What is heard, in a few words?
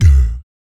VOC PERC, Vocal Planet Jazz FX BASS PERC